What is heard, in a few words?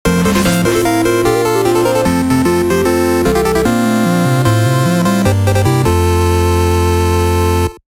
Boss Defeated; Cathedral